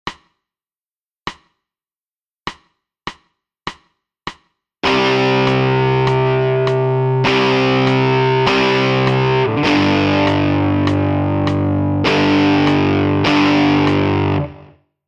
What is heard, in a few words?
guitar